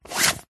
tin very small circular lid on spin short; foley; zapsplat

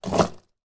household toilet brush put into holder

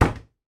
household kitchen cupboard door shut

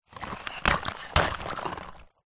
nature ice pieces break hand water

